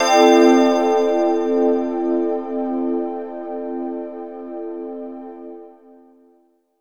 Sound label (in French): Accord parfait métatonal